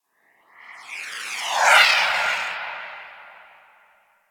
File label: Portal Random C; Crp Exterior Gln Interior